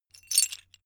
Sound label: metal pieces clatter short